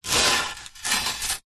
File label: foley polystyrene thin sheet slide face down on concrete; zapsplat